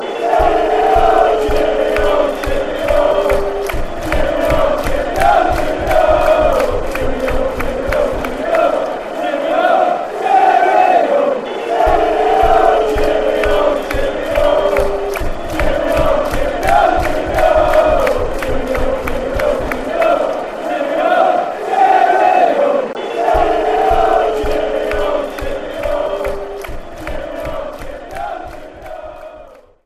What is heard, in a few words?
cheerio, fanchants; avfc